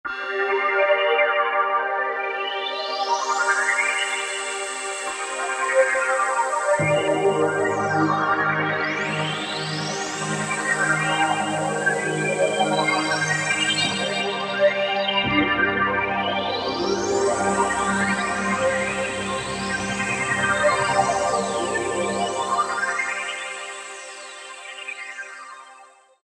Etherality, Roland SR-JV80-11 Techno Collection

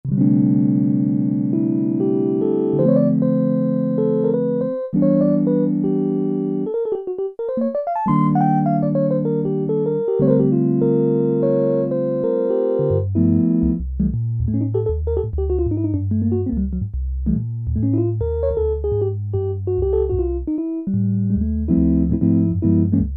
ELECTRIC PIANO, Oberheim OB-8; Factory Patches

